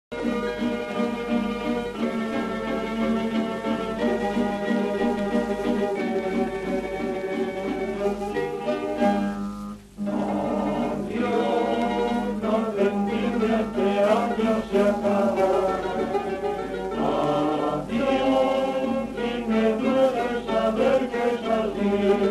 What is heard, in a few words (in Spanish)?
ADIOS DE VENDIMIA, vals